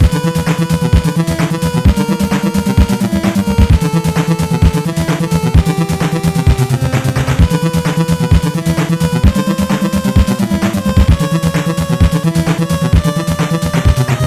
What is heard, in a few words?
8bit Loop; Stage4; sdl2-snakegame; Dennis Gunia